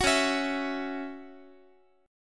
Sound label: C sharp Minor Double Flat 5th, Guitar; C#mbb5; strummed; JGuitar